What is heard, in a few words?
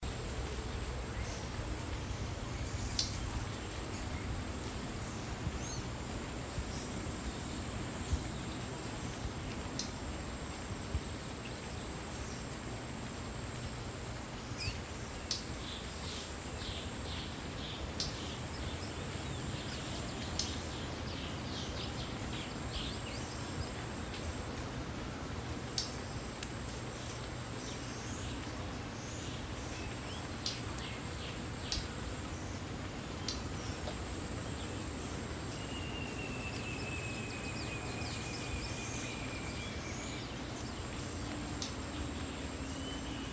Chloroceryle americana - Green Kingfisher